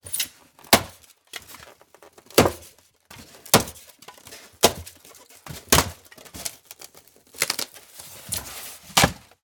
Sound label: Polystyrene Handling Breaking